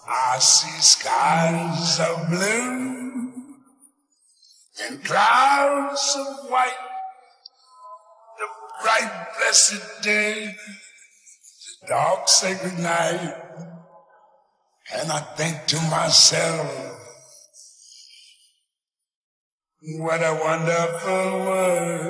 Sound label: vocals